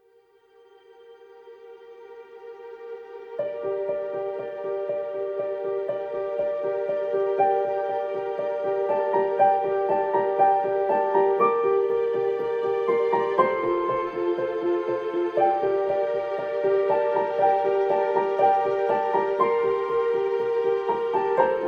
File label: no choir mix